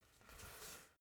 basketball spin turn in hands short; sport freizeit

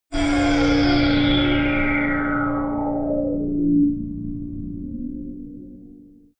dark alien teleport; energie

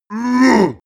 male giant attack; menschen; schreie

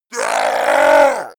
goblin scream painful; schreie

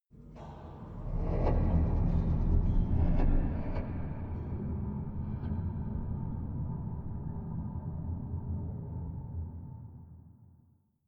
strong machines, horror

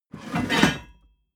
metal scrape wood soft

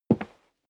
step leather shoes wood creak single right; schritte

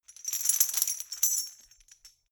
metal chain handle clean

